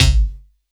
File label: BD, Drum & Bass, 160 BPM; Ueberschall; Drum & Bass Flash Trackz